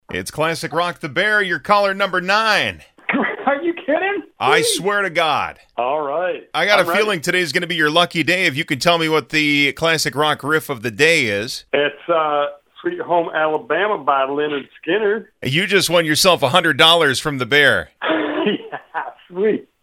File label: riff